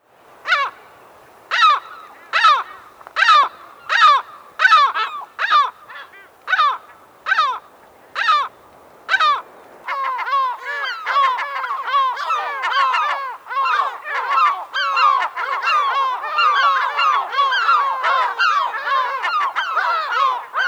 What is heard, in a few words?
Lesser Black-backed Gull